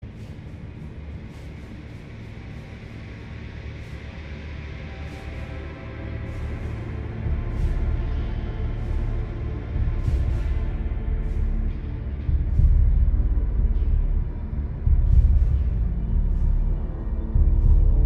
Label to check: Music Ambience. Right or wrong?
Right